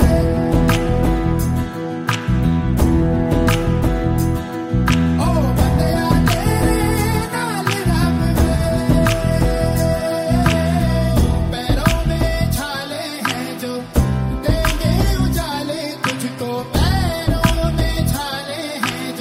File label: hindi music ringtone